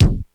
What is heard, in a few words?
kick; xr10